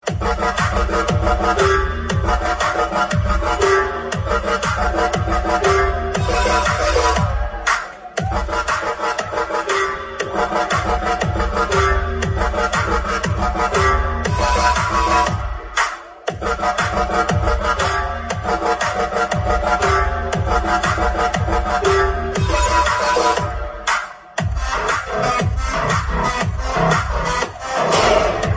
animal bgm

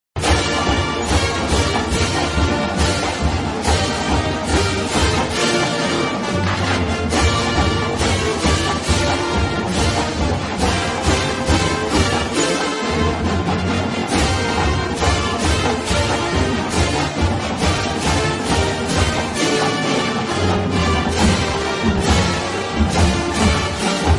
fight song

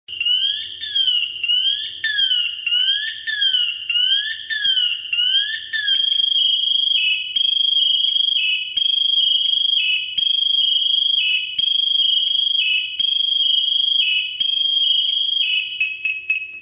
alarm

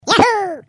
yahoo; minion

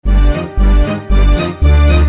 notify

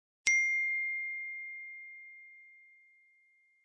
message ios; whatsapp

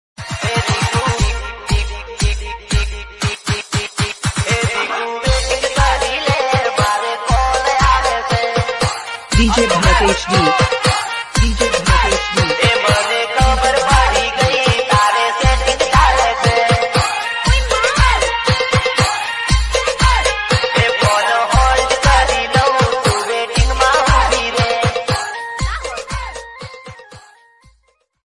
gujarati ringtone

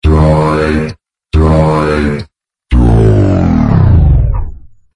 droid

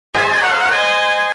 sound effects; batman

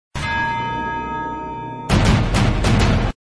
sms; terminator

